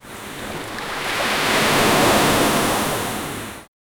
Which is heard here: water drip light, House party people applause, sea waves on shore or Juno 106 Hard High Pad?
sea waves on shore